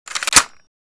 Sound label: Reload; WarRock; Scorpion